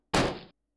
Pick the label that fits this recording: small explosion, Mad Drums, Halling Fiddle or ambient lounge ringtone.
small explosion